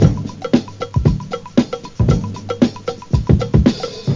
breakbeat; World of Sound; instruments; beats

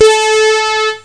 tone

